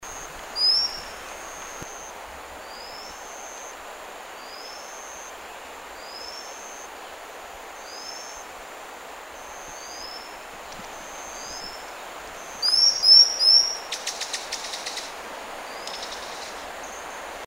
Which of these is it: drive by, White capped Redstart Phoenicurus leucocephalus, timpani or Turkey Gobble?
White capped Redstart Phoenicurus leucocephalus